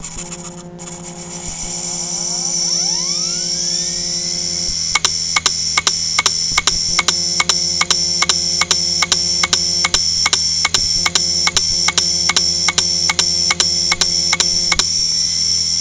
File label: click